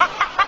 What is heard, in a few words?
laugh clown; ooh ahh